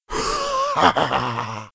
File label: Imp Laugh; Playable Tutorial Level